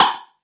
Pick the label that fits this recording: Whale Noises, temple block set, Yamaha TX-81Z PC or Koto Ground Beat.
Yamaha TX-81Z PC